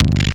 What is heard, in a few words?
KIK, Drums; Distored Reality 1&2